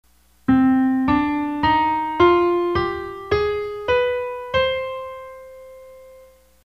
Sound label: minor scale